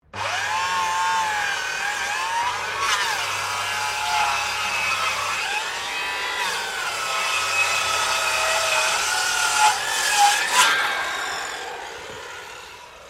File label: Power tool circular saw Makita cutting wood heavy load; Various power tools